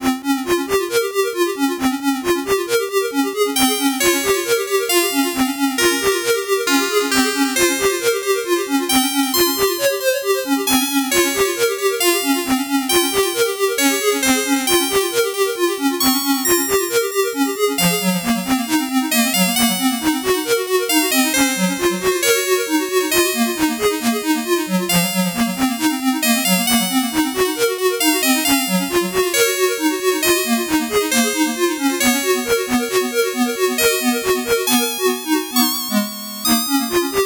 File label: 8bit bgm fast